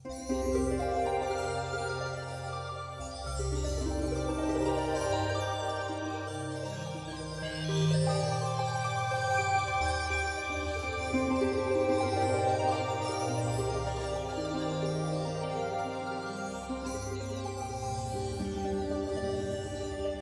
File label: Meteor Fadeloop; Proteus